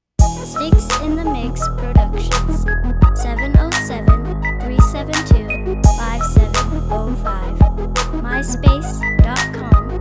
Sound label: Space bass